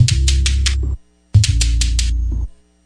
084 this bass swings weird, bass loops

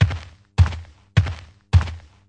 157 walking rhythm; 141 to 160 bpm

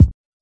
kick, Classic Hip Hop

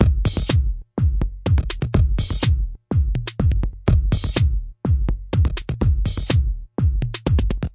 electro thudding, drum loops and breaks

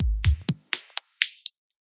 123 bpm kick filter ascending

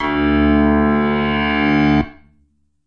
Moo Piano D2, backwards piano

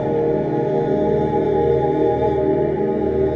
monk loop, MALE SINGING; VOCALS and SPOKEN WORD

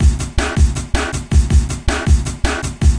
160 dnb beat; drum loops and breaks; 141 to 160 bpm

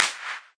CP, Claps

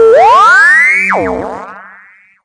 rocket; VIDEO GAMES

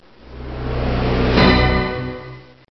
Minor Orch Hit; Cheesy Lo-Fi Sound Effects

